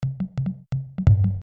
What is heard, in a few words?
Drums Extra Toms; D n B; Drum Patterns